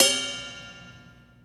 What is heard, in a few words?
short reverb ride cymbal sample G key, Royality free drum ride sample tuned to the G note